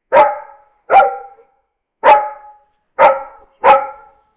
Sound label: little breed dog bark